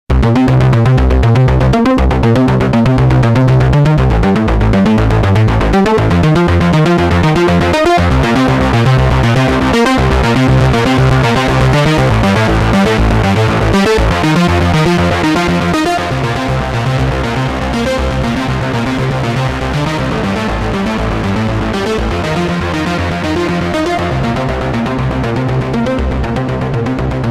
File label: Sequential Prophet X Rings Run